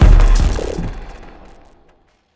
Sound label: new area